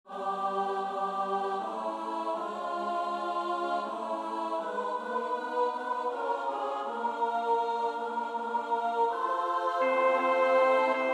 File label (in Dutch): bas 2, koorpartij oefening; Witt (Robert William); Four Motets to the Blessed Virgin Mary, opus 29; I. Salve Regina